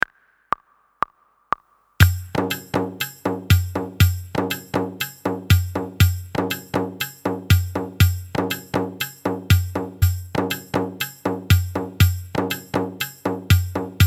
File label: Ti practice with Na without Tabla